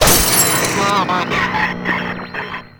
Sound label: Screen Blast; Warframe; Ambience; NineteenNinetyNine